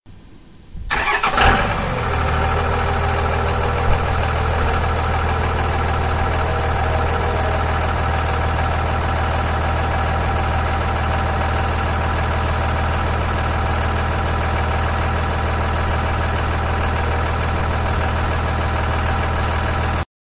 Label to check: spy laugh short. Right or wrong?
Wrong